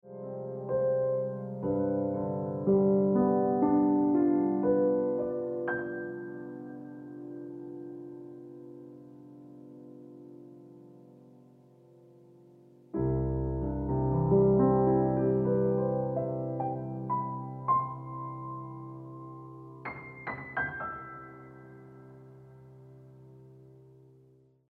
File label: Early Sunshine Ringtone Piano Music